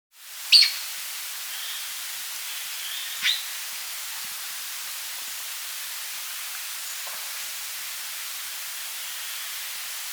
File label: Slaty Gnateater (Conopophaga Ardesiaca), Andean Birds; S. America